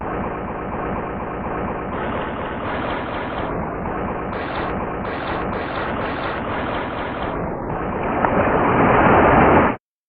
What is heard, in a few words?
sea shore; bass